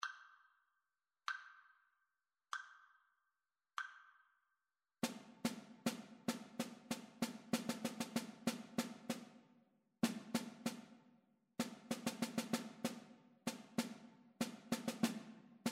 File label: rhythmic dictation; ear training